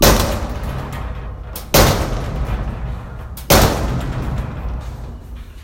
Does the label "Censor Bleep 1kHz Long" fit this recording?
No